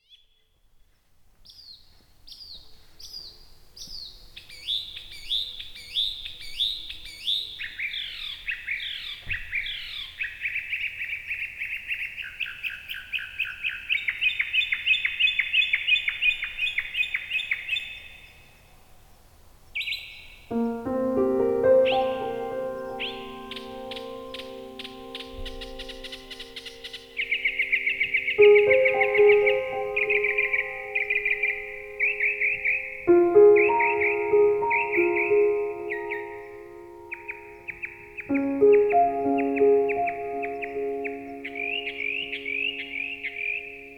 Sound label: Piano w bird